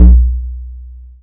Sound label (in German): Ordinary Techno Short, baesse